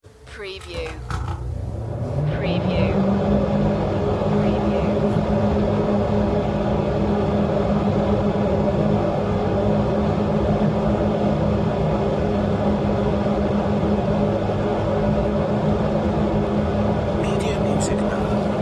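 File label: Slide Projector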